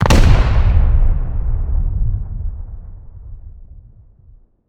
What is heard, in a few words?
satchel charge explosion; Rust